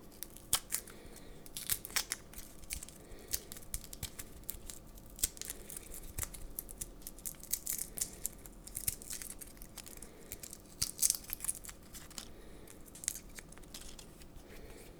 Peeling garlic

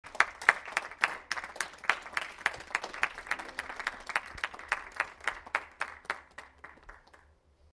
Applause end

